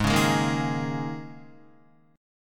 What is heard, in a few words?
Ab Suspended 2nd Suspended 4th Ab down Guitar; strummed; JGuitar